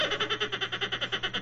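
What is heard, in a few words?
sound of how dolphins communicate with each other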